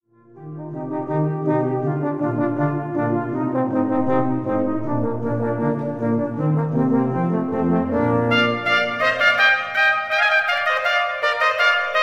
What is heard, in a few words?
Sinfonia con Trombe Allegro